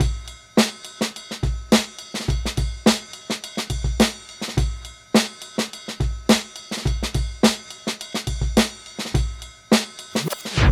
Acoustic Break

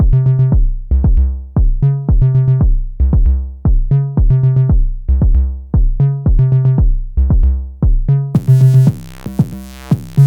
Analog Beat; Corrosion

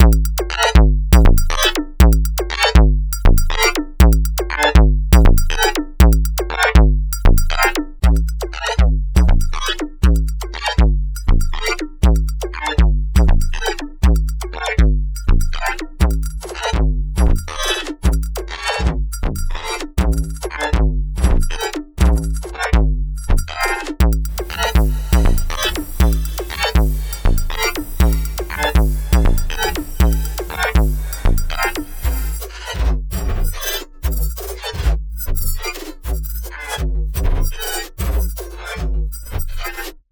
Hybrid Drums; Vague

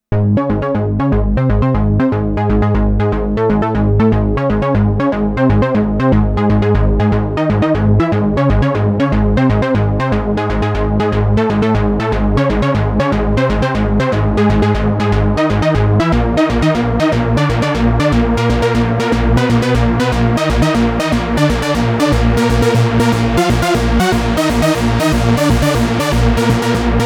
Stab Synth; dense

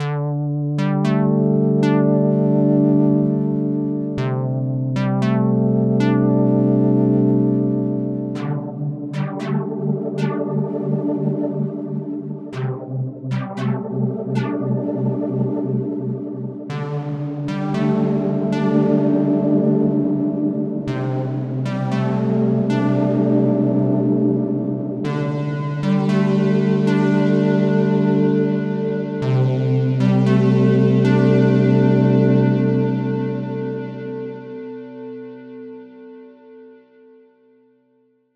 Poly Synth; Blend

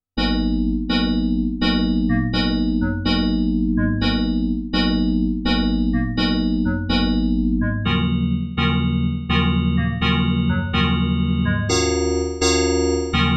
KORG Node; Moonlight

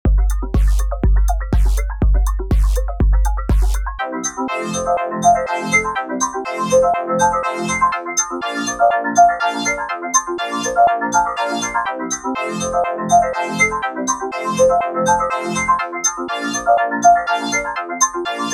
Hexonator on Electro House

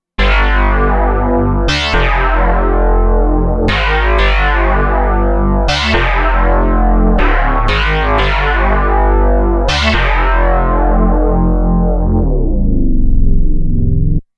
Metal Blast, KORG Node

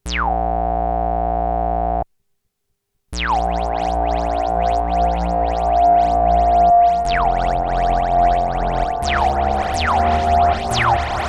Reverse Grains; KORG FX; Albedo

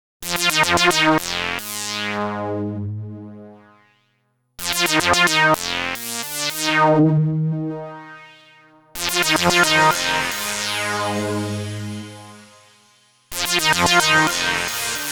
Analog Lead

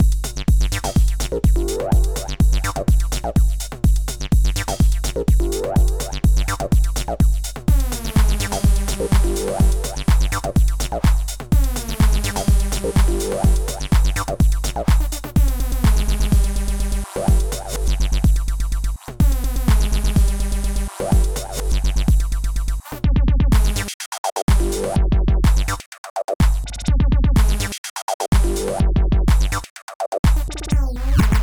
Techno Loop

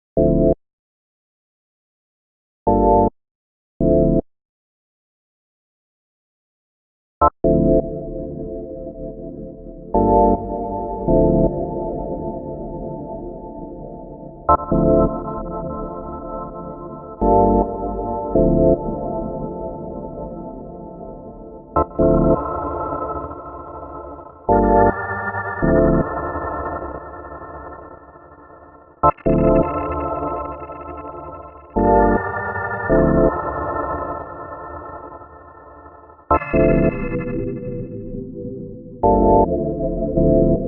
Synth Chord; Albedo

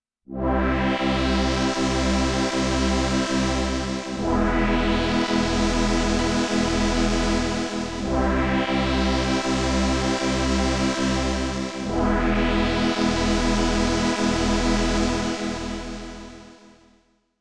KORG Odds Static Ring Modulation

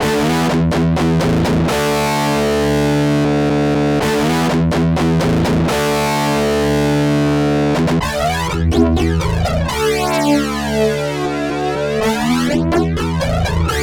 Electric Guitar; Dipole

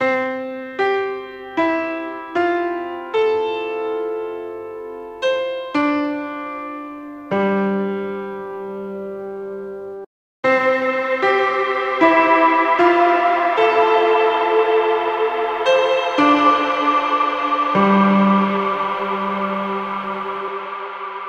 Tape Piano

